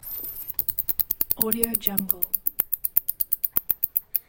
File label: bat hissing